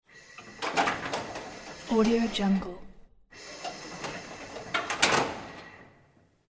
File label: old elevator doors opening and closing